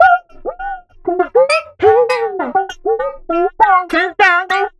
ohwhoo, 100bpm; transx loops

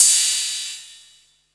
Crash, retro house samples, Drum Hits

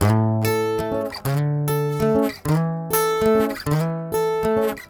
Traditional Guitar 098bpm A, African Guitars; Quazibeat - African Secret

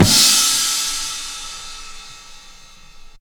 Crash A, Pecussion Hits; Zero-G Jungle Warfare 1, 2 & 3; 90 s Sample CDs

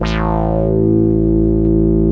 dance, bass; Techno Trance Essentials

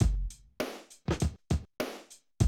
drum loop, 100 BPM

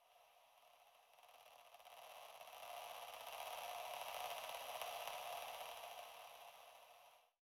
FX Swell; 99Sounds x Monosounds

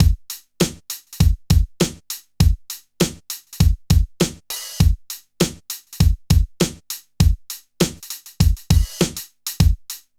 RNB, Funky; INIS

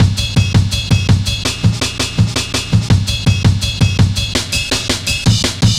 Samurai, Drum Loops, 165.5, Jungle

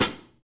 Rock Pedal Hi Hat, Roland BK-5

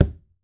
cajon; Voice Crystal - Steve Reid Definitive Percussion

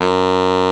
BARI F#3; Greytsounds - Scott Peers Sound Engineering

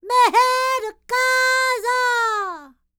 Vocal Planet World Voices, NOMADIC

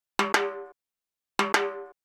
Timbali, Latin Percussion

